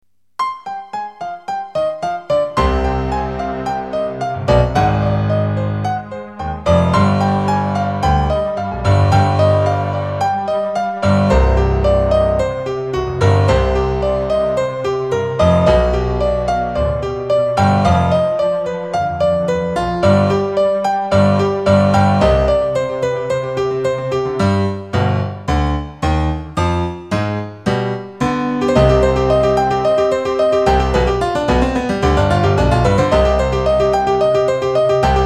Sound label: the dark piano